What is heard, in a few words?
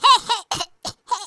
laugh, Grandpa; speech